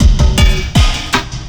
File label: Electronica Drumloop; Drums